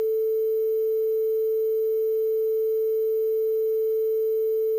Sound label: scc sine normalized phase no freq; msx